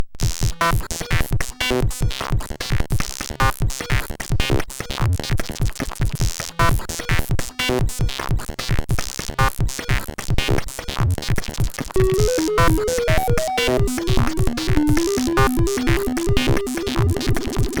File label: Ode To Metal, CSG8580R5